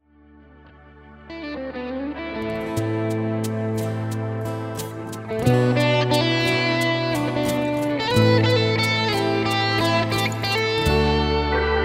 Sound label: samba